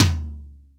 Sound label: MEDIUM TOM, ACSTC DRUMS; Pro-Rec - Synthsonic